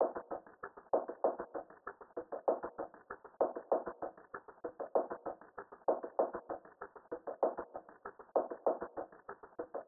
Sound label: Drum Loop 97 BPM Percussion; Loop Stems; Cymatics; Vibes II Drum Loop Collection; Waves